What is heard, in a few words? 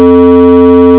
failed interval; Audio Signal Generating and Processing Project; m3